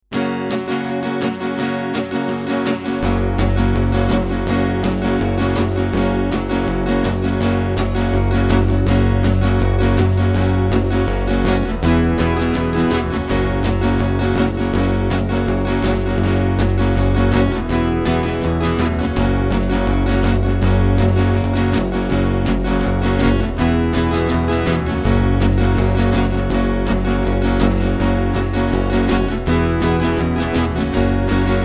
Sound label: karaoke